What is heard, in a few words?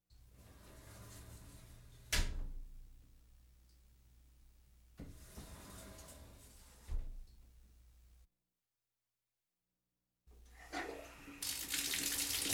shower